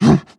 breath short, zombie

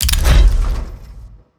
mainmenu press inventory, ui

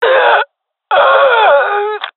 death, jungle fem; agents; jungle fem epic

